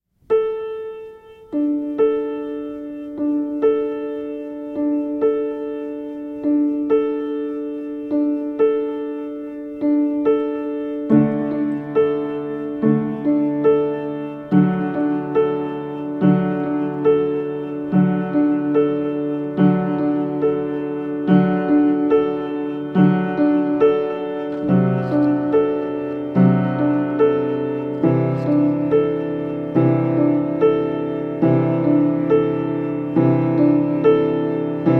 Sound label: Flutes Piano Rework